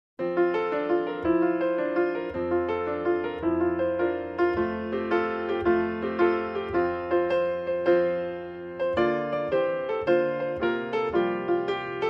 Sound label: The Wassail Song, Solo Piano